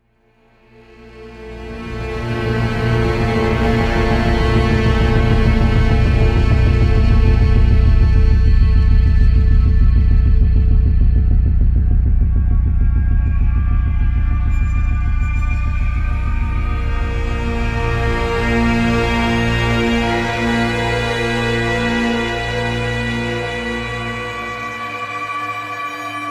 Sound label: MP Suspense - 135 Tech Combat Oneshot